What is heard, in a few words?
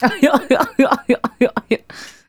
Female Laugh; Laughs; Sonic Foundry (Sony Creative Software); Vocal Toolbox